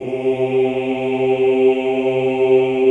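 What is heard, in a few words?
choir C2, Ooh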